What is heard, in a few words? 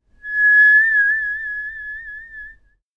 vibrato